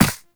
Run Ice, Footsteps; Warframe; Operator

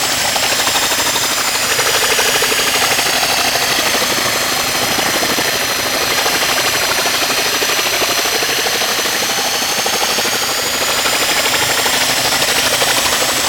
Archwing Stealth Movement Engine Loop; Warframe; Vehicles

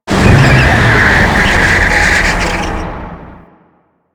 APC Move Right Circle; Enemies; Lasrian; Warframe

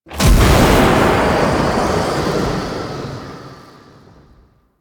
Void Dragon Firebreath Cast Lightning; Enemies; Abilities; Warframe; Duviri

